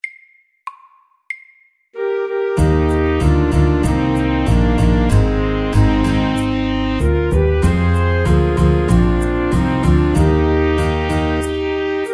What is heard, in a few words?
Mariachi band SAKTE